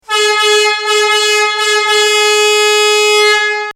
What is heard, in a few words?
Emergency Horn